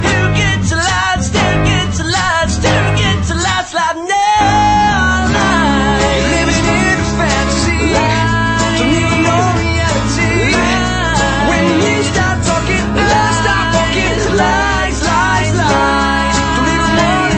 Acoustic

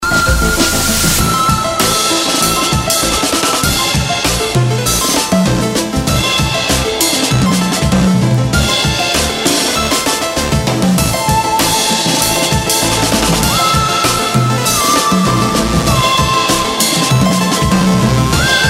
Drums

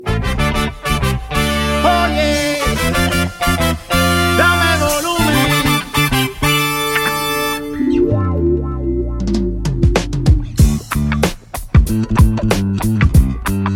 Funky

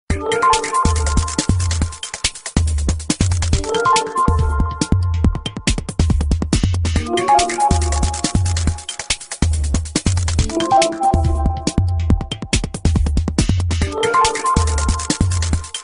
Nokia E7 New Rintone

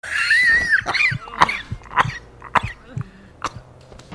Wheezy Laugh; Dashie

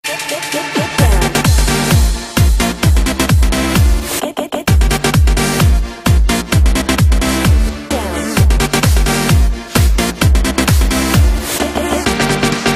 Electro Mix

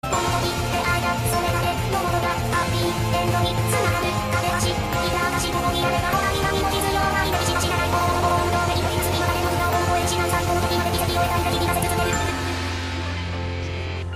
Intense Voice; Miku

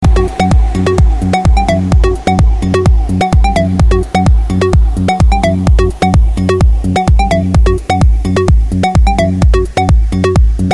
Progressive House

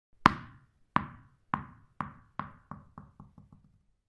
Tennis ball

